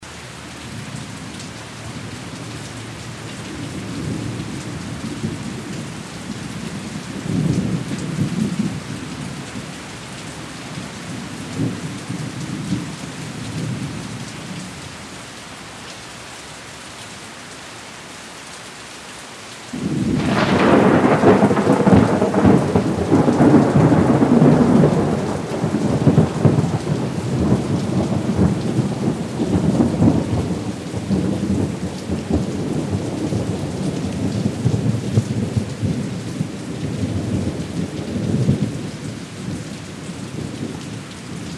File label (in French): Orage roulement Pluie moyenne; Orages